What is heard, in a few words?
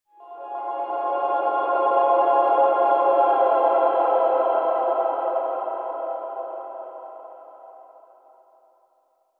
Angelic Bend